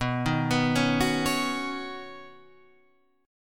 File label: B Major Add 9th, B, down, Guitar Standard; arpeggiated; JGuitar